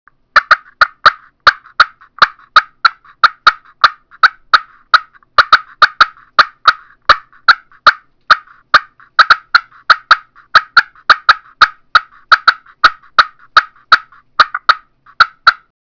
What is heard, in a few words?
clear crystal cutts, turkey; Southland Sounds